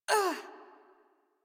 Generic Short Pain Operator Voice

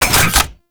Pump Shotgun Alt Mech A; Weapons; Warframe; Zariman

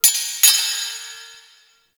Zariman Sparks; Warframe; The New War; Ambience

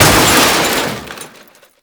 Car Metal Smash; Warframe; Nineteen Ninety Nine

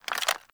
Reload; Warframe; Weapons; Supra

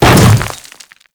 Hammer Boss Step Dirt, footsteps; Warframe; Grineer Hammer Boss

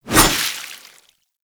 Deco Hover Whizzby; Sorrow; Warframe; Warframes; Pax Duviricus

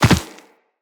Land Soft Dirt, Footsteps; Characters; Operator

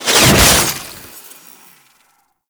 Kuva Lich Finisher Parazon Stab In; Warframe

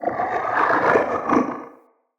Corrupted Slug Vocal Idle

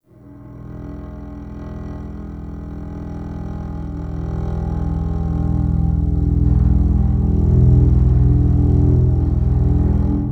Flower Structure Drone; Tau; Flower Farm

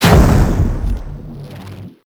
Explosion Impact; minigame; boss; Warframe; Caliber Chicks

